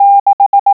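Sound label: SIX, morse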